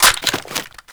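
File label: weapon foley m14 reload clip out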